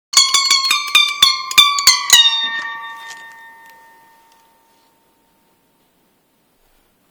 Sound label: Egg harp